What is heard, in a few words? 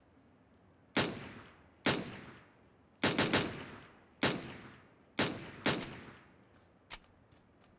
Suppressed P1911